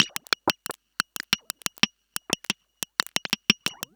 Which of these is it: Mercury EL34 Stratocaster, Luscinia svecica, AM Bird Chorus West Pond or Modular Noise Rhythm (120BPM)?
Modular Noise Rhythm (120BPM)